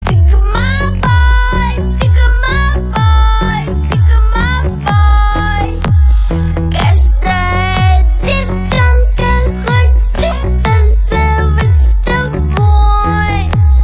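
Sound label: slow motion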